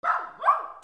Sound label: DOGS